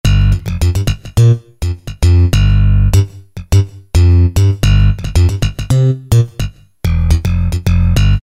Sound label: bass Slap, E-mu PK-6